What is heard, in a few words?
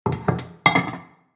Ceramic plates stacking; household